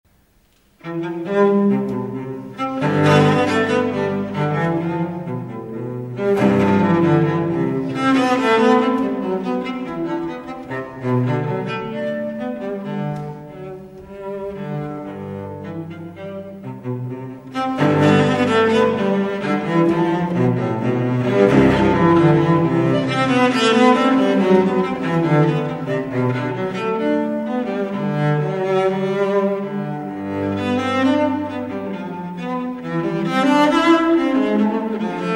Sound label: bach bourree